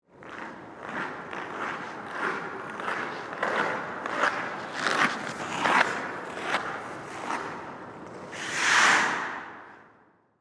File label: Ice Skates Pass By, Stop, Indoor, Ice, Rink; Sports; Ice Skating; BlastWave